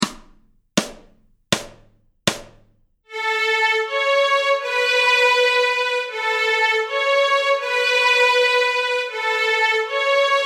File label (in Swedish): Stråk A dur, Nyckelharpa; Till Paris; Notträning